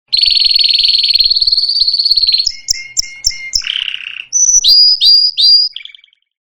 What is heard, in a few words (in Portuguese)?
passaro cantando, sons de animais